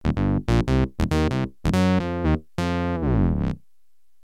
Fat Synth

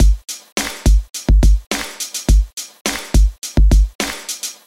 HipHop 105bpm

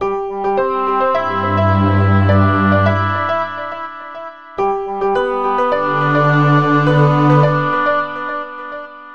Orchestra 105bpm

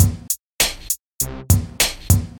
Hip Hop Drum Loop

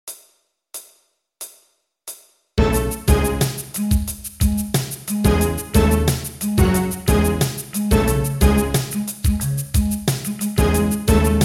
Midi

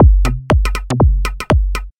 Nu School Techno 120

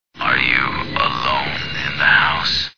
Horror Sounds

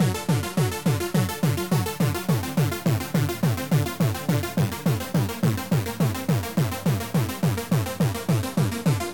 Happy Hard Sound

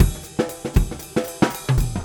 Jazzy Drums

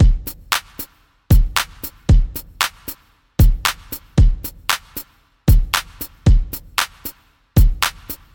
Hard Simple Beat

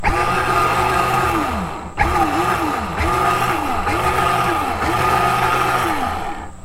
Electric Motor Sound

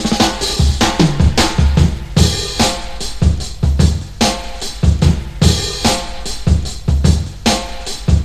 Live Drums Break

